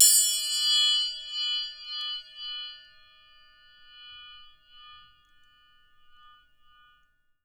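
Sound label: TRIANGLE P left, percussion; Miroslav Vitous - Percussion & Harp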